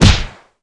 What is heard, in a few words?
knockback